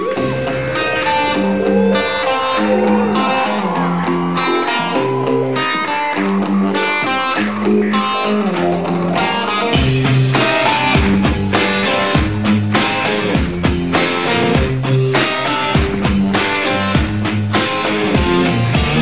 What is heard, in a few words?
Guitar